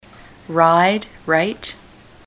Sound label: ride right